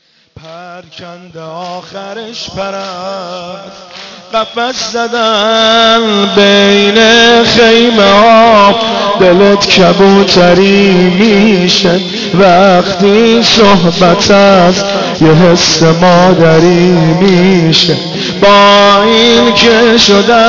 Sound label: Mobile Ringtone